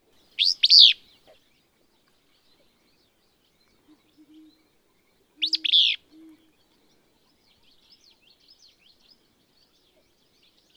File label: Willow Flycatcher